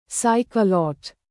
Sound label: clapping